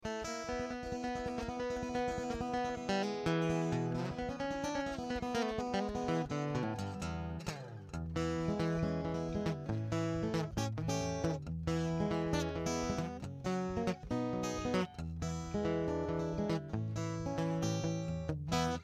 maton picking pickup; maton ebg 808

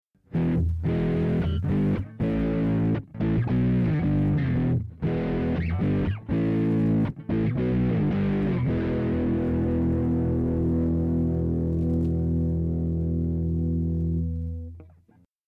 vox ac 30 high gain low tone; vox amplug ac30

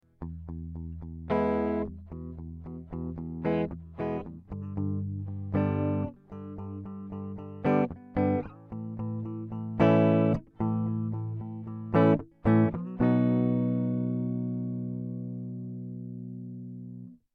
marshall bluesbreaker clean; marshall bluesbreaker ii

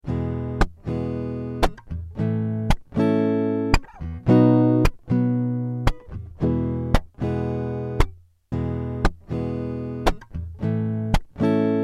boss rc 30 three dub replace guitar

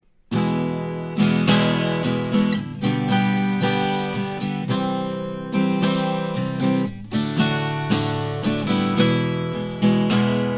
ovation celebrity 44 mic strumming

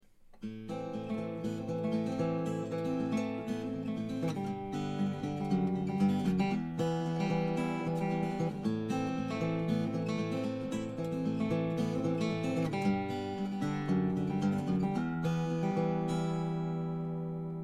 ovation celebrity 44 mic picking

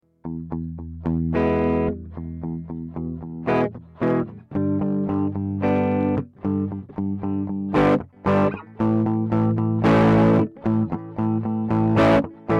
marshall bluesbreaker blues; marshall bluesbreaker ii

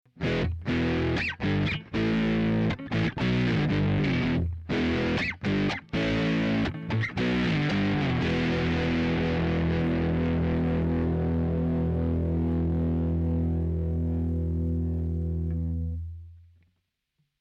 vox ac 30 high gain high tone; vox amplug ac30

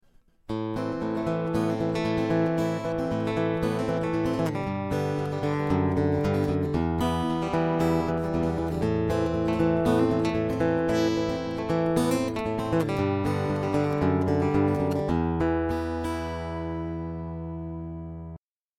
ovation celebrity 44 cable picking

